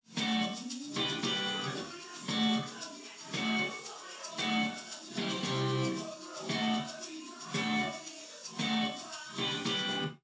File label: drums; SiSEC11; underdetermined